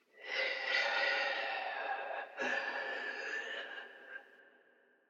lamento uomo; Inquisitor 2

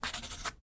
Runa del muro; BLIND QUEST - The Enchanted Castle

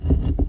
Pietra presa; Inquisitor 3

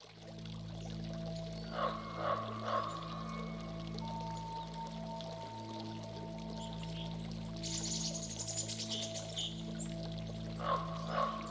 AMB Città Reale; BLIND QUEST - The Enchanted Castle

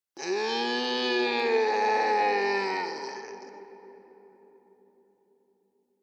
lamento uomo; Inquisitor 3

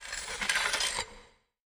Rastrelliera Armi; BLIND QUEST - The Enchanted Castle

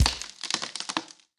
Alberi tagliati; BLIND QUEST - The Enchanted Castle

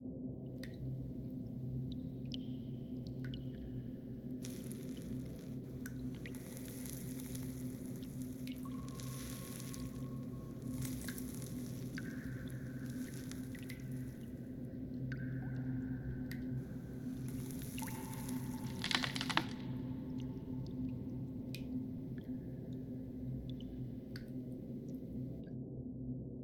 AMB Segrete; BLIND QUEST - The Enchanted Castle

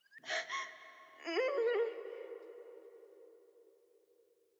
lamento donna; Inquisitor 3

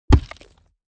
Pelle Dura Hit

